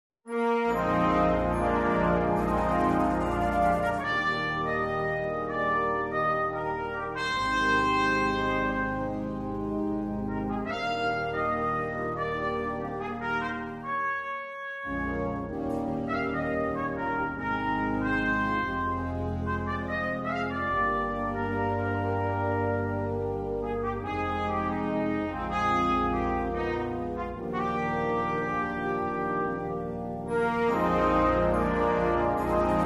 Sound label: Divertimento for Trumpet, Fanfare